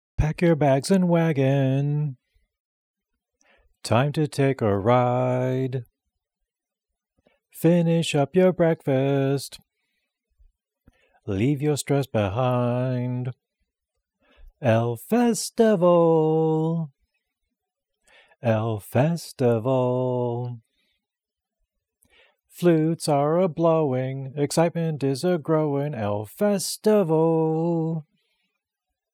vocals

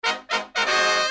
brass loop

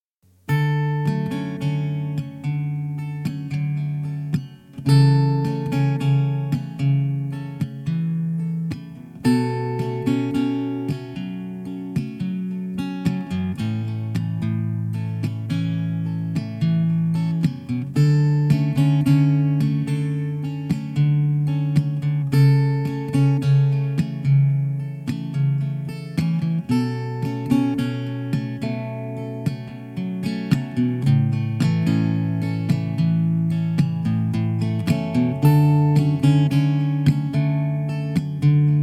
A 110 GTR Mellow Arpeggios

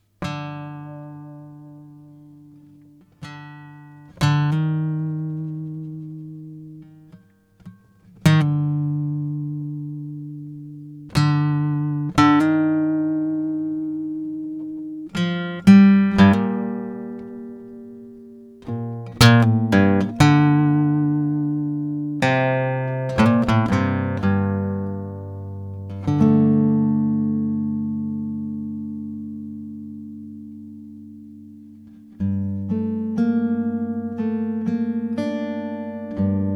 Gm120 GTR impro

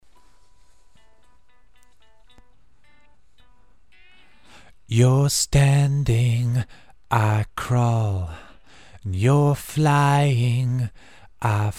vocals

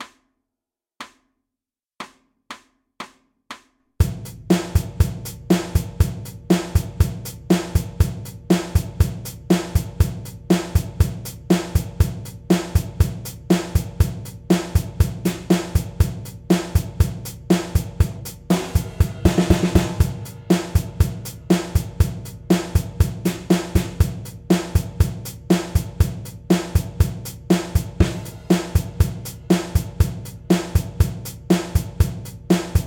Drums 120 BPM

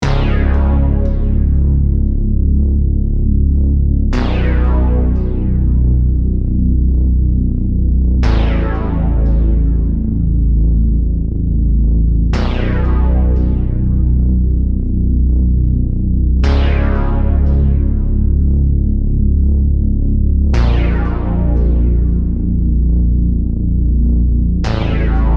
Love Bass Loop (No Side Chain) Access Virus

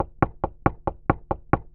contact perc

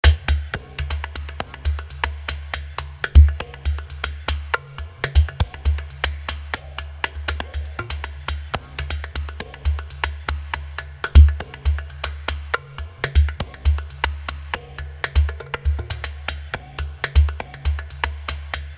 Euclid Urdu percussion

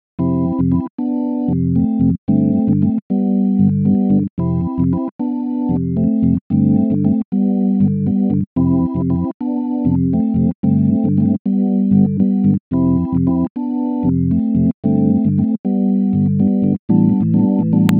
That Stupid Organ Riff

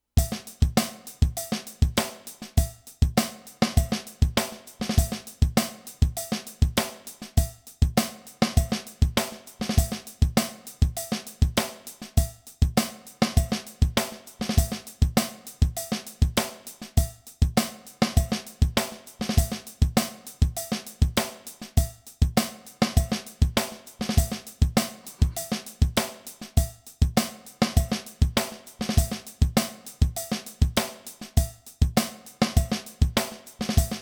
guitar hackery in A; admiralbob77

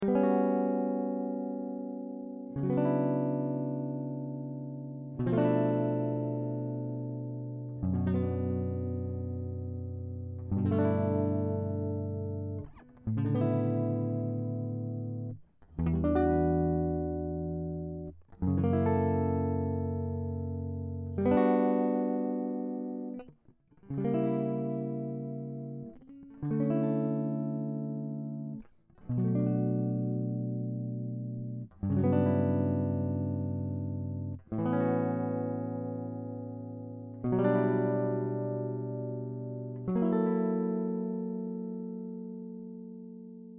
Retro Groove

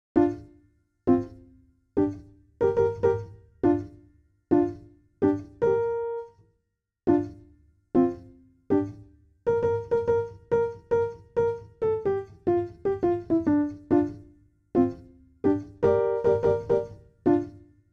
Felted Piano

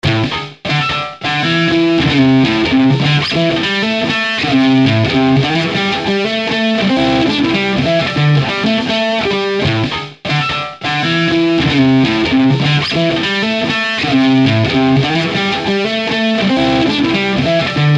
A100 Rocky Riff

